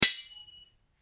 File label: Clash; Sound FX